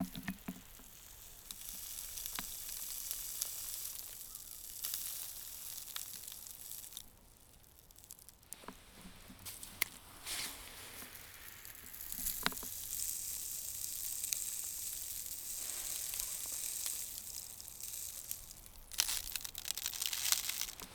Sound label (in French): sable sur bois et plastique; mashteuiatsh